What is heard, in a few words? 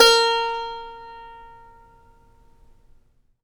SAZ A#4; Spectrasonics; Hans Zimmer Guitars VOL-2